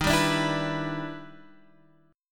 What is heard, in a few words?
E Minor 7th D sharp; strummed